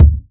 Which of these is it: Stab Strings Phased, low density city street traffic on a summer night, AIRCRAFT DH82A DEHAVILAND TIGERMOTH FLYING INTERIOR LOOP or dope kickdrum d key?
dope kickdrum d key